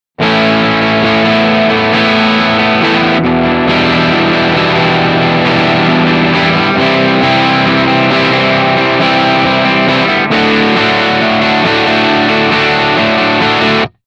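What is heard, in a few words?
DRIVE, Guitar Rig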